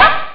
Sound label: bark; sc2; chenjesu